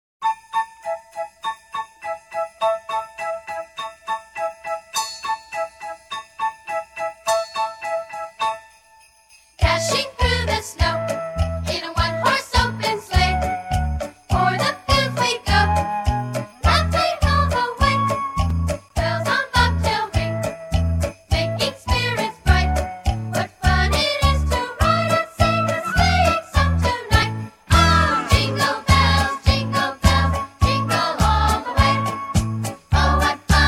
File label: Childrens Choir Jingle Bells; Christmas; Disney